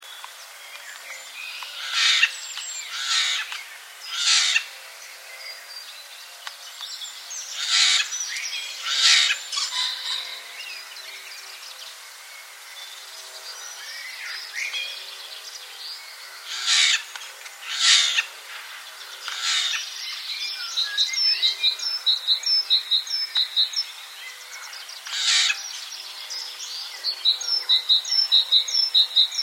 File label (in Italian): Ghiandaia